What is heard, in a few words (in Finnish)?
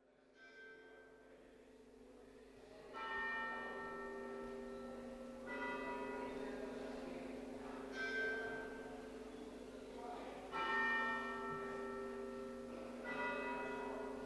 Kutsukellot